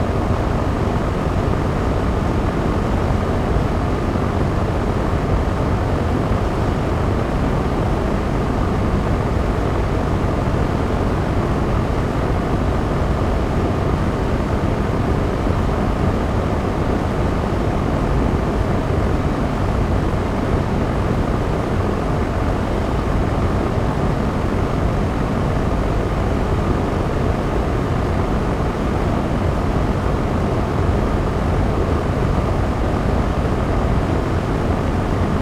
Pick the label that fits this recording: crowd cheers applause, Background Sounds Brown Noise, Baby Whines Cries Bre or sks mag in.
Background Sounds Brown Noise